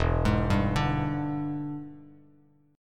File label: E 7th Sharp 9th E down Bass Standard